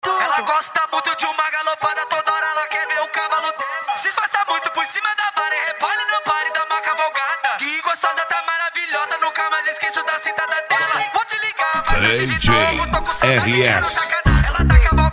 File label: mega funk; mini cd mega funk